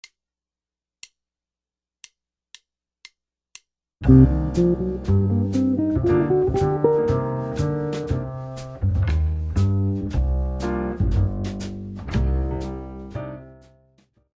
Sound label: minor blues scales, guitar